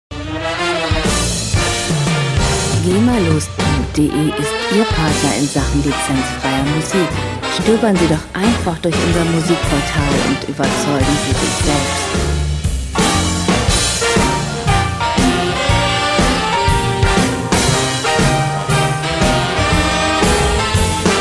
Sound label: bigband